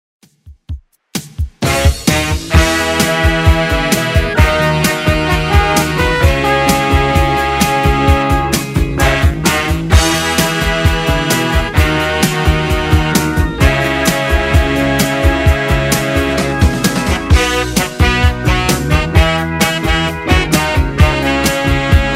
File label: 70s, 130 bpm; Redrum